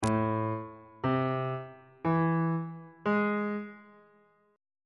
a harmonic minor 7th chord 1 is a minor major 7th on bass clef